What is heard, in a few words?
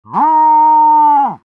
jeers; pyro